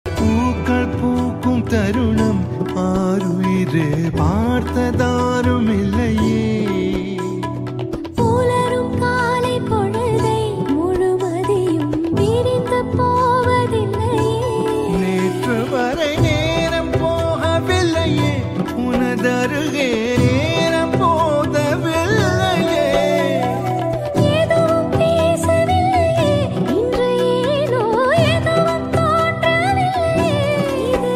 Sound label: tamil song; arya; love song